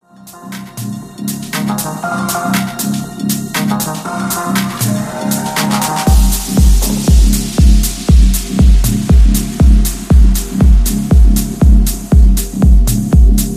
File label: bass ii bass